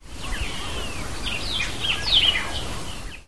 White-eyed Vireo

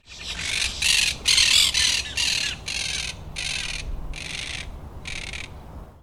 Monk Parakeet; Markham Pk FL

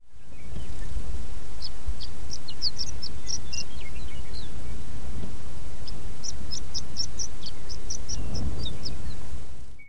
Horned Larks; Pawnee NG CO

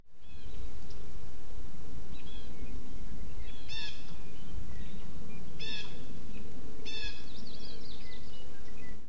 Blue Jay

